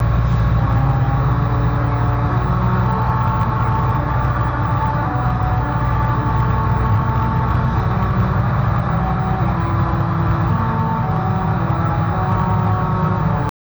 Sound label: sample melody